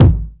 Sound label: sharp kick drum g sharp key